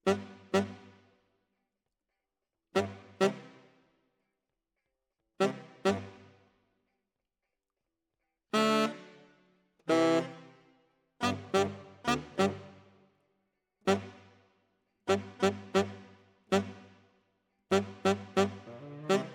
Tenor, RNR for piano

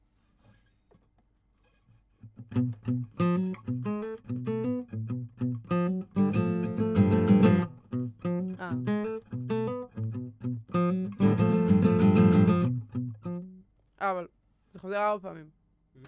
GTR

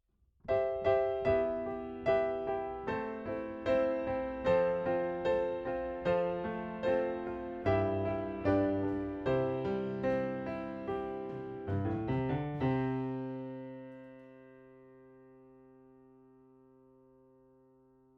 Piano

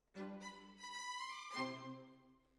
Decca, Jazz for piano

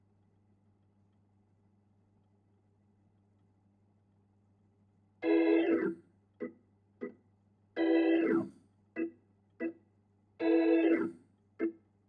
Hammond

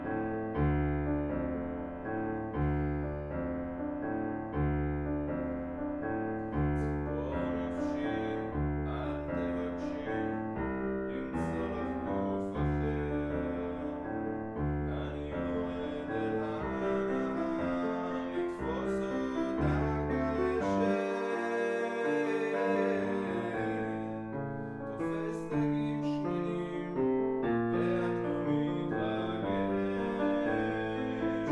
Room L

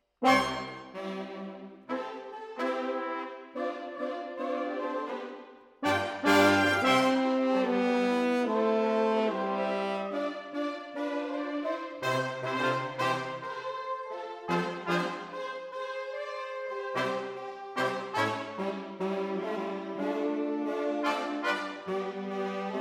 Horns Over

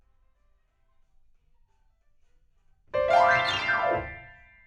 PIANO, RNR for piano

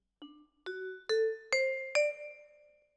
Vibra Close

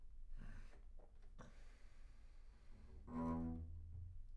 Bass Room

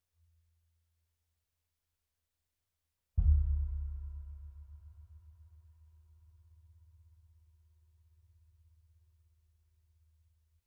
Sub

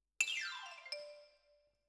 Xylo

